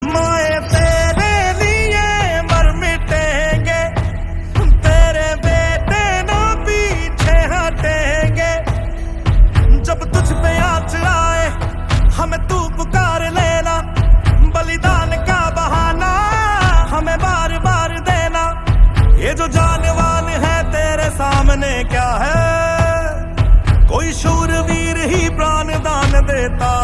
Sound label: Ringtone